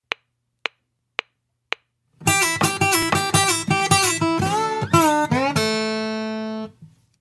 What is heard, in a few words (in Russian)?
Слайд гитара